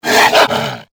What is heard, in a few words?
attack, dog; enemy; Soldier Of Fortune II Double Helix; XBOX